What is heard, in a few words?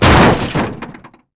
hit wall; NASCAR Thunder 2004